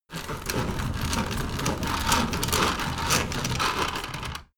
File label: window roller shutter open fast